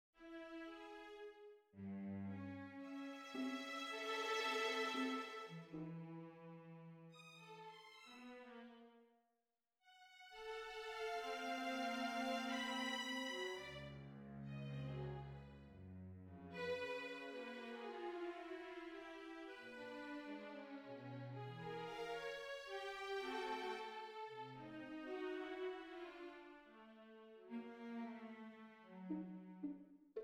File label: Trio